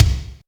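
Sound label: bass drum, drums; Best Service; Real Mega Drums VOL-1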